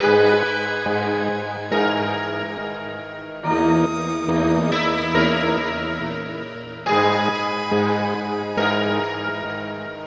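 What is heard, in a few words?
140 melody loop G minor; on road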